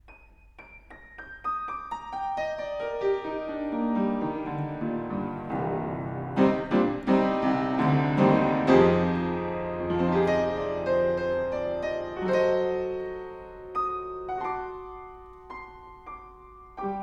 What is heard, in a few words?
upright panels on 1m pair